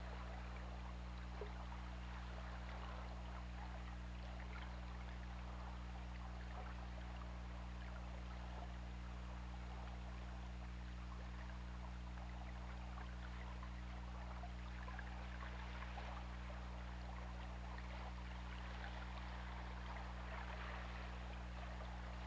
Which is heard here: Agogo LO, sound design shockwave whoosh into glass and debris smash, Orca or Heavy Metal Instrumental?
Orca